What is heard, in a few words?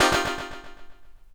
DX200 Stab Echo Vinyl Synths Cmin11 C3; vinyl chords